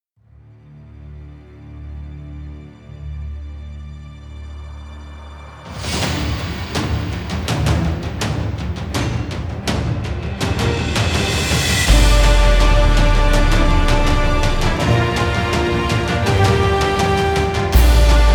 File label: winter steel no choir